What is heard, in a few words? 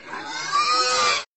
piglet